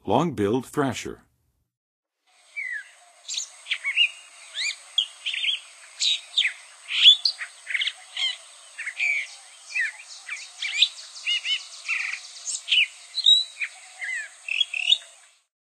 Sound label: Long-billed Thrasher; North America; Western USA; Western USA Songbirds